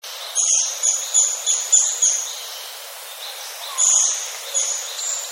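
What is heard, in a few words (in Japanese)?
kogera, Japanese pygmy woodpecker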